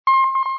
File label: warn